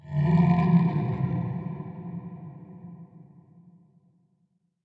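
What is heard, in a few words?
terrain ambient guttural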